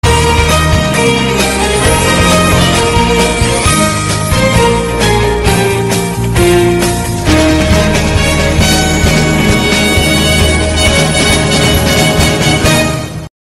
outro no talking

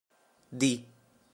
key

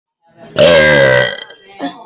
very intense burping

